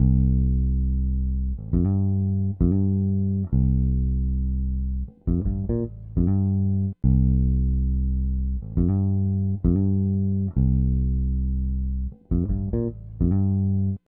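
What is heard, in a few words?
bass 68 C; ProSamples vol.34 - Hip Hop & RnB 3; Slow Wined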